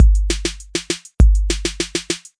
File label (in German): betonungsuebung, Trommelworkshops; betonungsuebung 1